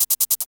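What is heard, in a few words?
timba 74 shak, hip hop R&B; USB Soundscan vol.19; AKAI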